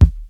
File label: smooth kick drum sound D# key; kick drum samples